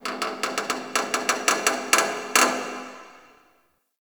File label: Metal Creak Small, Ambience; Warframe; The New War; Zariman